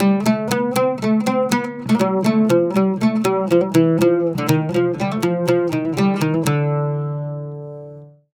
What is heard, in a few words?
120 OUD, Mediteranean Traditions